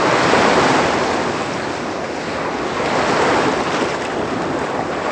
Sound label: Sea